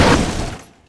IMPACTO MURO LEVE; AOS F1 2022

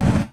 V6 EXT BAJA MARCHA; AOS F1 2022

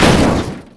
IMPACTO COCHE BAJO; AOS F1 2022

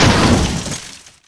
IMPACTO MURO BAJO; AOS F1 2022

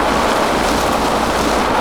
EXT DERRAPE HIERBA; AOS F1 2022

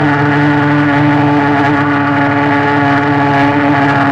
RODADA PIANO; AOS F1 2022

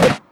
V6 EXT SUBE MARCHA; AOS F1 2022

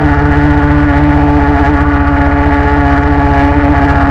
RODADA PIANO; AOS F1 2022

